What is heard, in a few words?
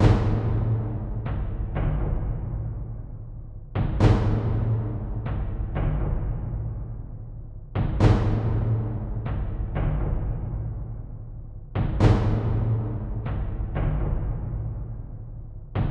Exploration Exploration-Intensity; Star Ruler 2